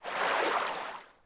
tread; DAoC

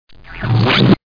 slick; How Many Bugs in a Box; juicebar

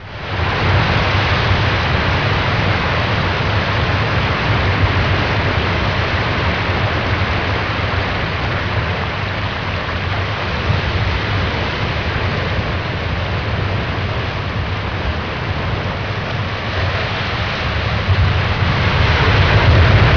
ocean Wv; Wayzata Technology

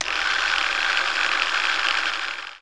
gliss; MOBICLIC41

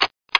SWITCH

